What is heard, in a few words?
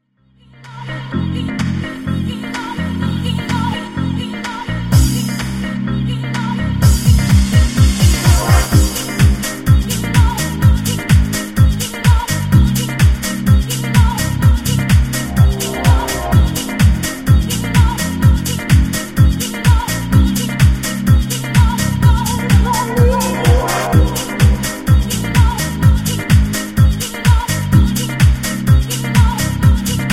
Night Spots Guitar In Dub